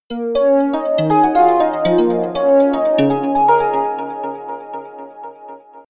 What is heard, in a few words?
ringtone melody